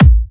131BPM Kick; VFH1 131BPM Summertime Kit

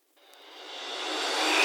Cymbals Reverse Crash; Vengeance Samples

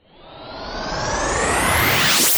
Sweeps Medium

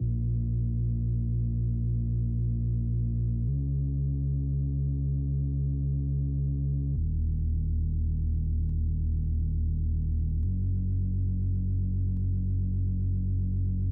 Bassline Sub, 138 BPM; Vengeance Samples

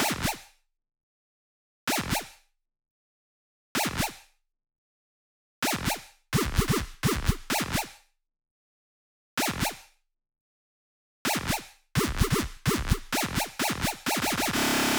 128BPM Melody; Vengeance Samples; Jamboo Kit

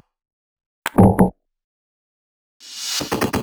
Loop 140 BPM; vengeance essential club sounds-3

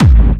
Bassdrums Clubby Kicks; Vengeance Samples

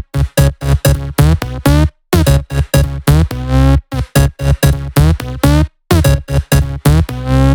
Root C# 127 BPM; Vengeance Samples; VEH3 Special Sounds

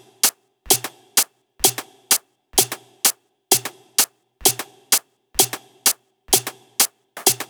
128BPM Drums; Vengeance Samples; Hopper Kit

